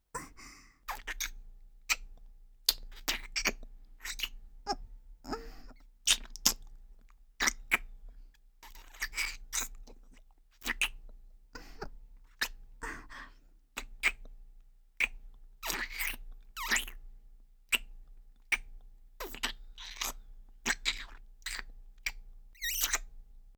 キス (ノーマルキス)

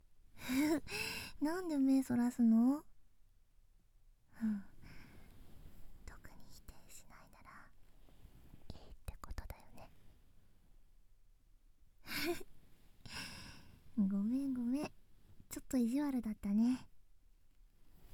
耳エステ 耳舐め,ASMR; 安眠・囁き・癒しの空間!～裏エステサロン編～

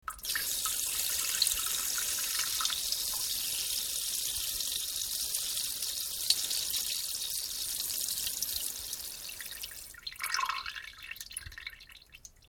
しょおおばちゃちゃおしっこ

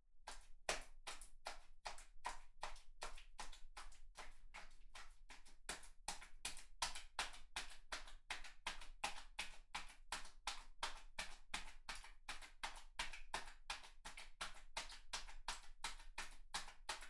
ピストン 高速; KU100; アダルトな音

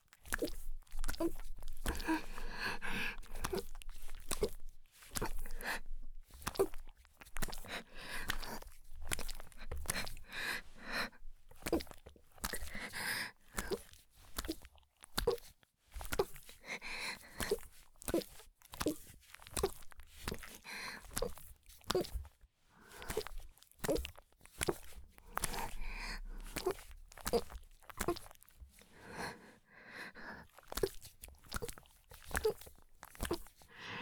ギシアンループ; 田舎の別の無感情少女と自己犠牲愛

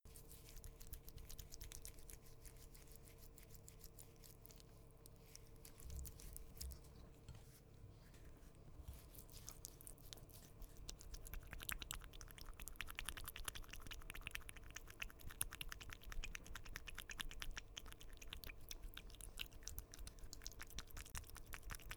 クチュ音, 効果音

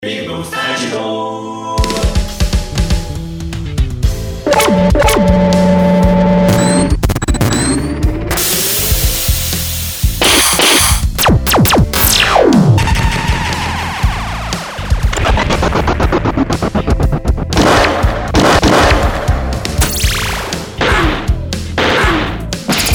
アニメ銃; ぴぐみょん効果音素材集; ぴぐみょんスタジオ

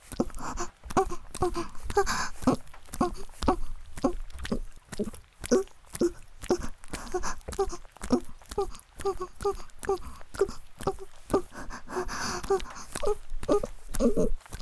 雨降りバージョン ギシアンループ, ASMR; 宮崎弁; 母の喘ぎ声無しバージョン; 田舎の古宿ン娘の夜伽

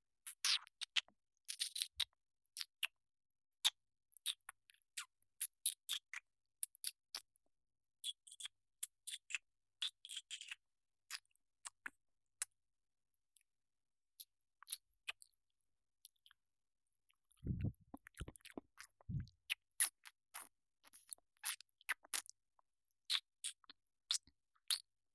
左耳耳舐めのみ; 好き

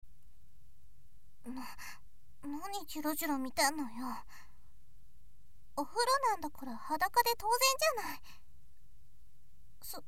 お風呂でフェラ (SE効果音)

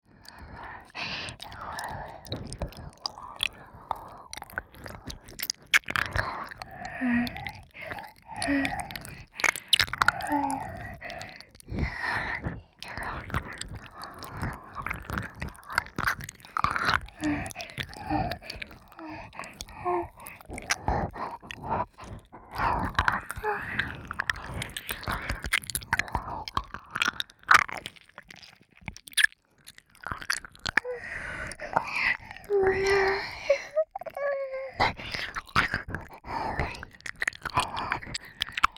耳舐め 両耳 吐息・喘ぎ入り

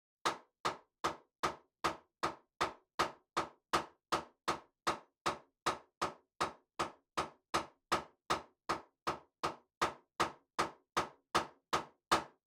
切る 虚空; 3DIO; 食事に関する音

